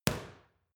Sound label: boxing paw hit hard